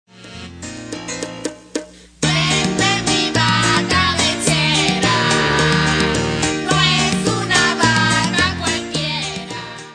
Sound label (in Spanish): Salsa